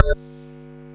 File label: Bell Hum; FX Hit; Drums; Ableton Live 12 Lite; Core Library